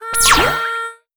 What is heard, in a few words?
fs Blue Charge; netent